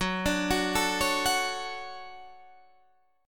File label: D-Major 7th Gb